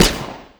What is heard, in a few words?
Shooting; 完善武器音效; DungeonShooting; Godot